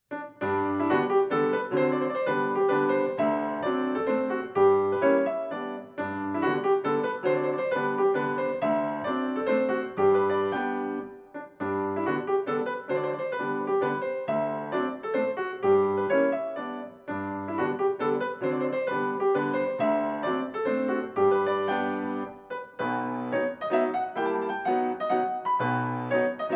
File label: chopin mazurka posth g major; chopin mazurkas